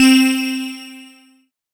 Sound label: LED C4, Roland MKS-80 synth lead; Leads and Keys